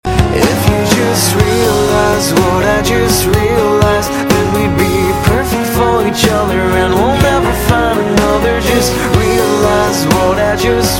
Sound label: Male Version